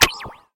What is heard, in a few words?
click button